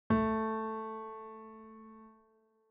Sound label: tenor note